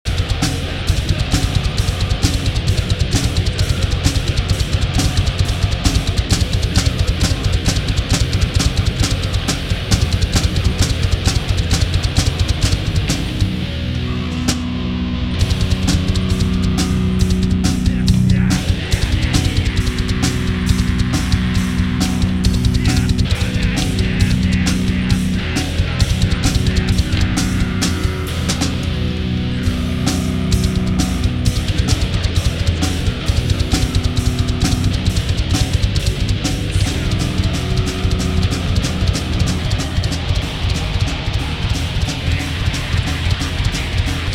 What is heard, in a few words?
improvisation